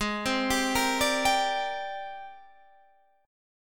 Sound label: G sharp Major Sharp 11th, chord; G#M#11; arpeggiated